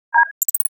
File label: Beeps tone short series